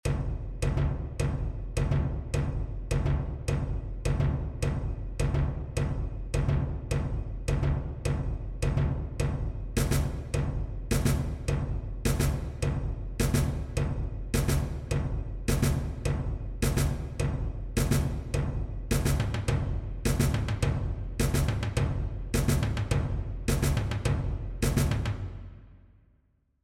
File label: perc